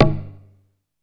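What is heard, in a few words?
PERC 3 G1, percussion; SEQ AND PERC; Music Store - Claudius Diemeter Schwerlos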